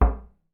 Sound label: glass, steps; VoxelEngine